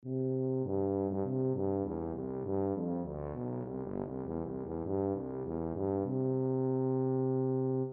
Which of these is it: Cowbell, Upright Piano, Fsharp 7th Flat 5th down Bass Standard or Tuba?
Tuba